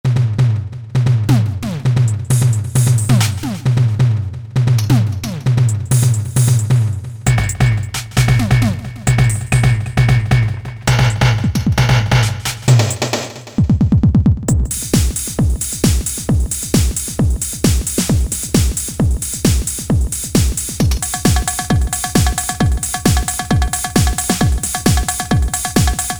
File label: Kit, E-mu Proteus VX